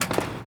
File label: Skate Park Skateboard, percussion; Jamail Skate Park; Houston